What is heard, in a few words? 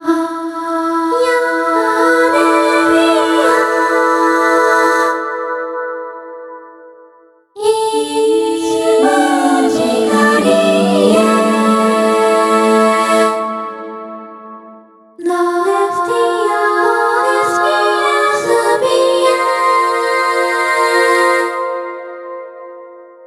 Choir Xfade; PeriTune Material